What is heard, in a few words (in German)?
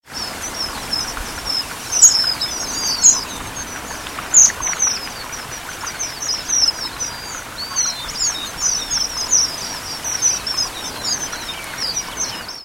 tigerfink